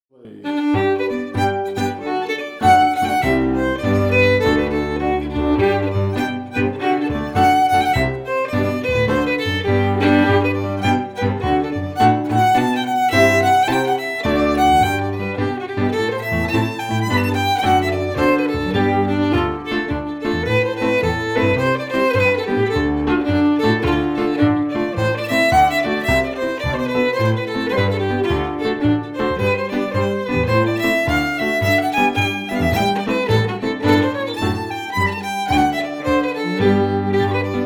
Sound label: Jig, FIDDLE CELLO PIANO; Jink & Diddle Book o' Tunes 2021